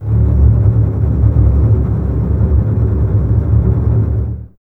D 1, Basses Tremolo, String Ensembles; Miroslav Vitous; 90 s Sample CDs

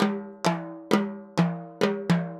Timbali, Latin Percussion

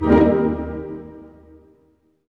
HIT, Tutti Hits; String Master Series; Dynamic Orch

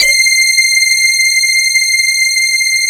DS8 C 3, Korg; Back In Time Records; Korg Universe VOL-1

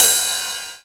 Crash, retro house samples, Drum Hits

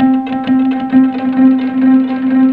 KOTO C2

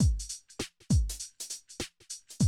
drum loop, 100 BPM

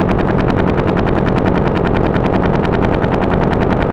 Machines; Dream Zone

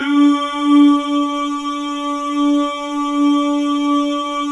DOO D# 3, Synclavier Strings; ILIO

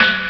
bell, Amiga Soundtracker Sample Packs

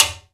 piala, timbales; E-MU Producer Series Vol. 2 – More Studio Essentials; Composer; Timbales Xswitch

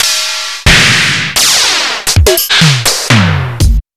Kurzweil Electro Drum; Kurzweil ElectroDrum Prototype

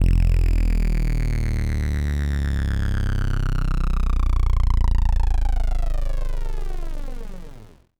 Subdrop F; 99Sounds x Monosounds

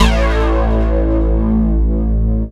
dance, bass; Techno Trance Essentials

